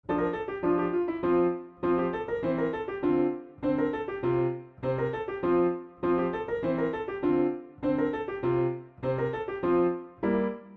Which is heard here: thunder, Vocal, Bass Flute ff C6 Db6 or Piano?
Piano